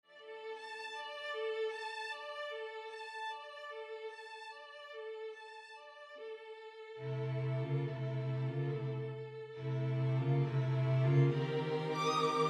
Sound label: string octet